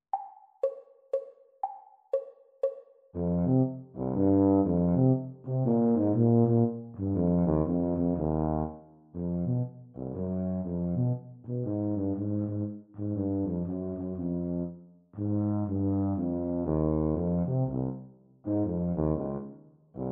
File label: Eb Tuba Duet exam speed; Grade 6